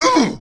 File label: pain